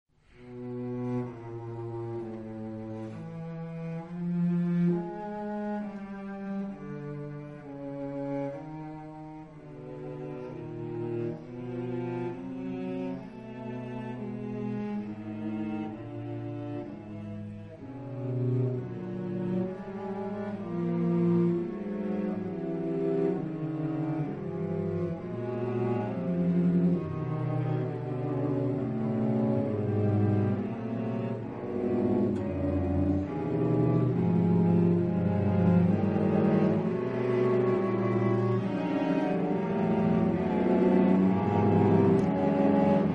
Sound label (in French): sonate pour 8 violoncelles